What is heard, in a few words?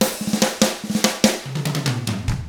rock 6/8, drums; ILIO; Double Platinum Drums 1